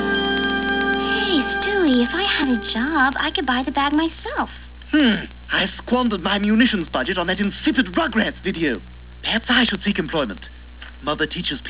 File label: piano; stewie